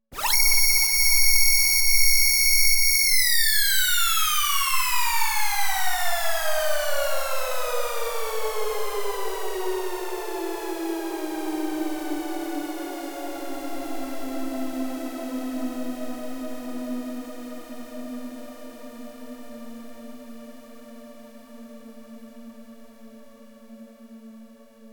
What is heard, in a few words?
Scream siren